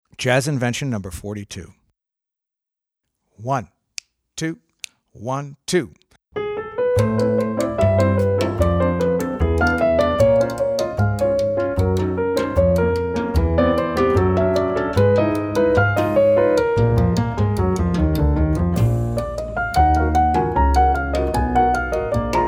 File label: Jazz Invention No. 2